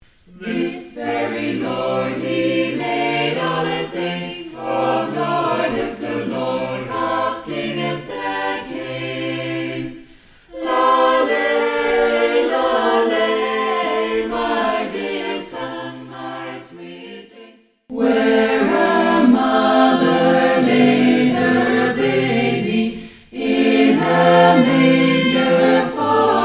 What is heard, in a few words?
Christmas Carollers